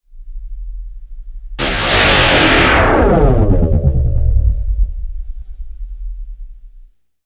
Zap Synth, Sci-Fi; Sound Design

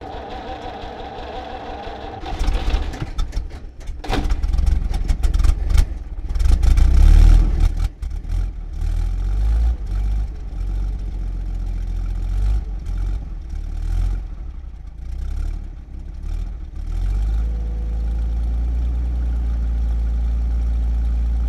1929 Sedan Int Start Idle Revs Off; Period Vehicles

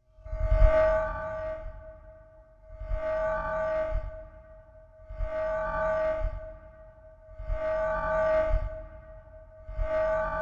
Cyclotronic Throb; Sound Design

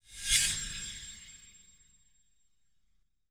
Flash Frame Lite; Title Sequence Effects

